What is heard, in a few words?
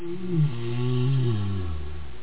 hurt; bard